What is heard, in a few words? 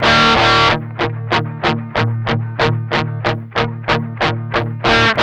med rock 8th, guitars